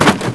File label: CRASH, 碰撞声音素材